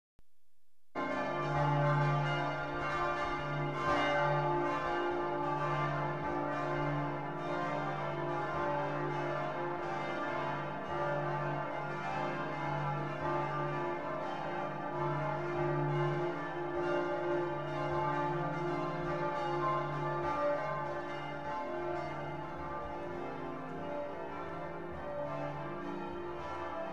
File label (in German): Alle Glocken, Frauenkirche Dresden